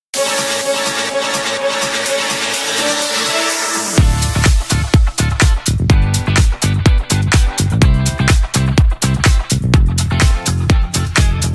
instrumental

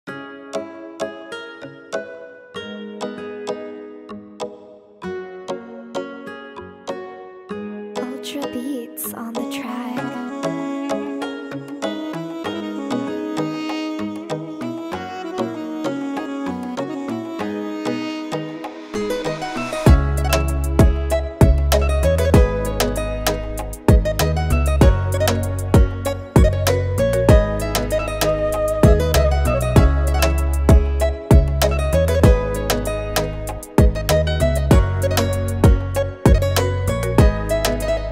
oriental dancehall type beat instrumental

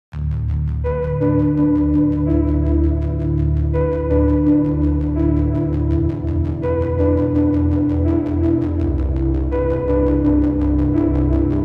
ultra slowed reverb

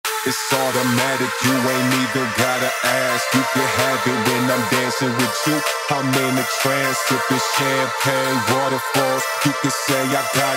club mix bassmusic

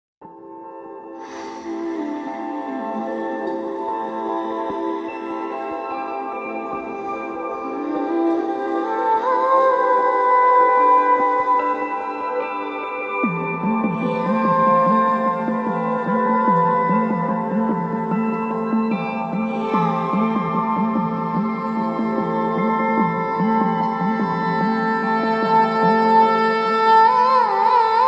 female slowed reverb; aashiqui 2

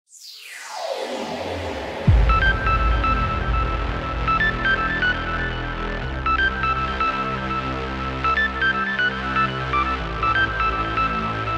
electro freestyle music

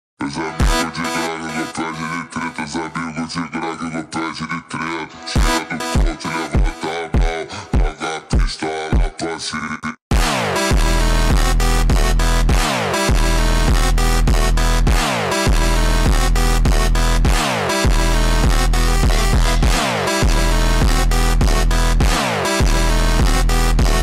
funk do balkan slowed reverb

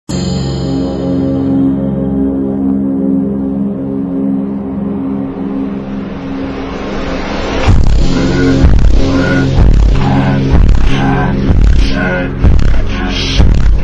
ultra slowed reverb

